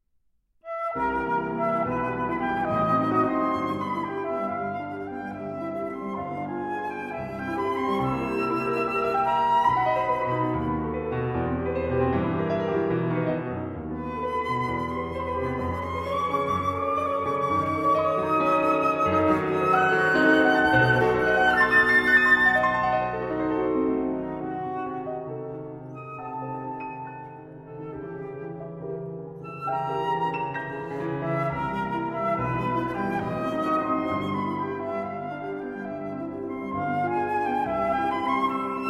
Sonata For Flute and Piano, Finale Allegro Molto Agitato; Undine Op. 167